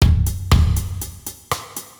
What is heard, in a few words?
Transition, batteur